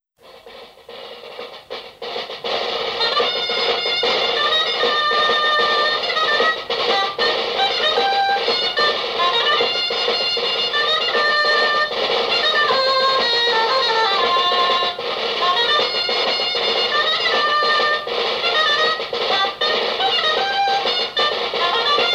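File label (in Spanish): Baile corrido, Bailes tradicionales en Valladolid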